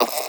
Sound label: Cryptilex Vocal Tranquilized Grunt; Characters; Warframe; Hunting Critters